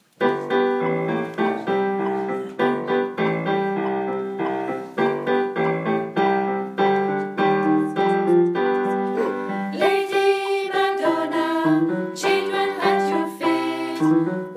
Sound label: sopranis